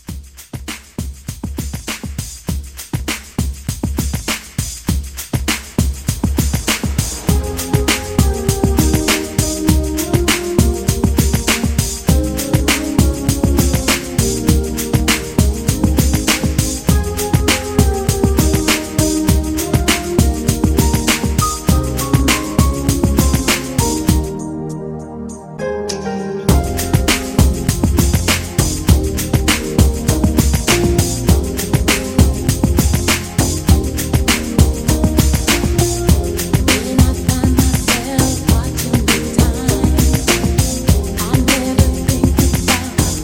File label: 70s Re Drum